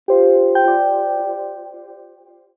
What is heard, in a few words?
Alert